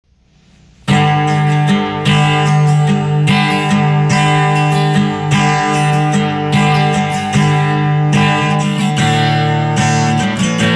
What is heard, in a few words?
instrumental version